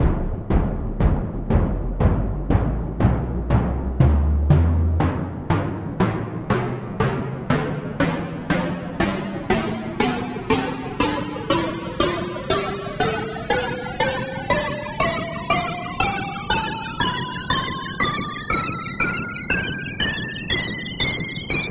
timpani tonleiter

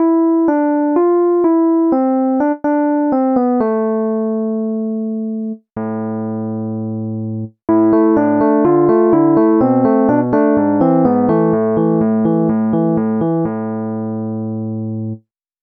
rhodes ep; SpectMorph Evaluation #4

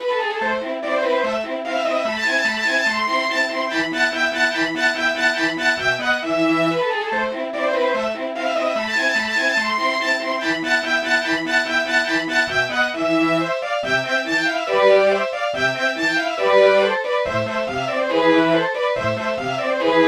slow strings

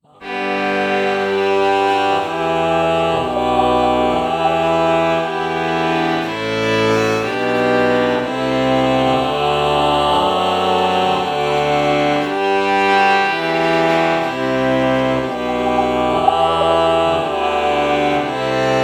ah violin lpc true bach morph

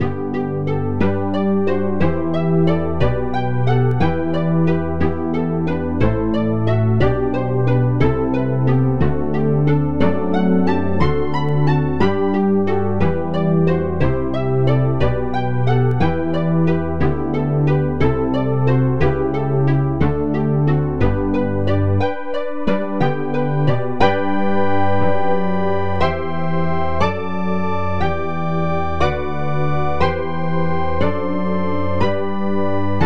chiffer lead

